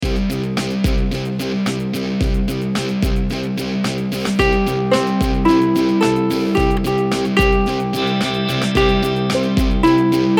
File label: Green Cats Eat Ants with ukulele; Rainbow Ukulele Play-Along